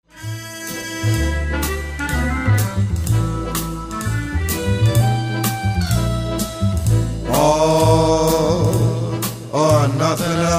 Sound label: Impersonator; Dallas